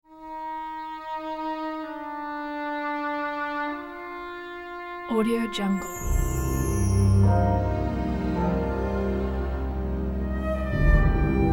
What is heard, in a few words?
uncertain foreboding movie theme; sound-ideas